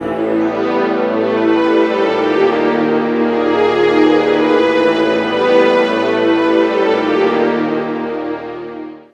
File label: BAC, MUSICMOTIVES; Best Service ProSamples vol.49 - FX-Area; AKAI